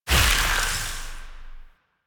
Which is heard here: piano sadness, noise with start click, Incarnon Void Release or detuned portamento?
Incarnon Void Release